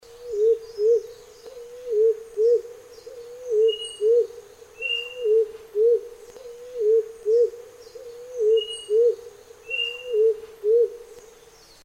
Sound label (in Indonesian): suara walik kembang